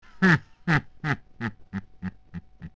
Ganondorf Heheh

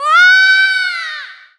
Fall; Link falling off a platform

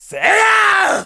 Great Spin; Link's Great Spin (charged sword-spin)

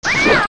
Hit; Stinger

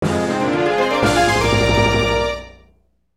Fanfare Heart Container; Get a Heart Container

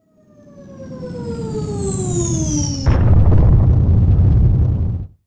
Moons Tear; A Moon's Tear falling

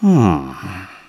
"Hmm"; Sahasrahla: "Hmm..." (speaking to Link); zelda

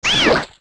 Hit; Bari; zelda; OOT

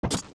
Steps Bridge Jump; zelda; OOT

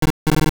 Error; Oracle

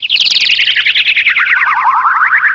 Dizzy; A player turned dizzy

